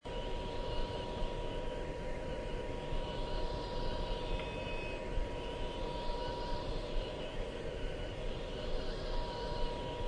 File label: white noise burnouts